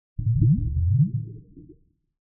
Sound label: Bubbles Underwater Slow Deep - Submergence; Hollywood Edge; sound designer's toolkit v2